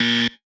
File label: Wrong Answer Buzzer